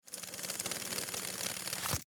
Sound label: take cash, atm